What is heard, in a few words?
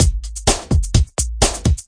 new funk, drum loops and breaks, 127 bpm